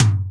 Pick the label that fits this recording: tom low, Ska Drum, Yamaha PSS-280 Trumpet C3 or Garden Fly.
tom low